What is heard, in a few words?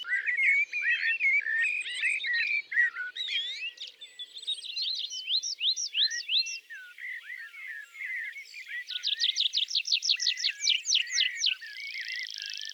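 tree pipit; lesnoi konek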